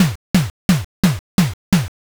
footstep run

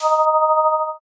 shield up

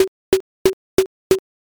mokugyo

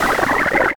drown

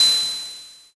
ride cymbal

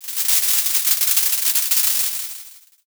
ovation

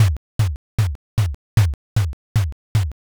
bon taiko

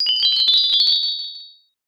xmas bell

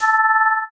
shield up

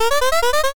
synth voice

